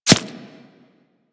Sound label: Arrow Impact Flesh; Warframe; Weapons